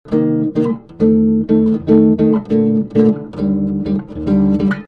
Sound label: basslab chord mic, unplugged